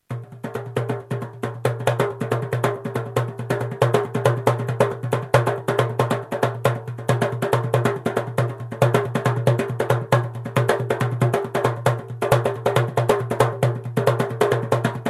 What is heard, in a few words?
darbuka